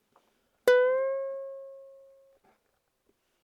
bend, ukulele

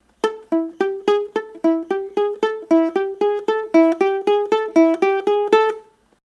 Solo Riff, ukulele

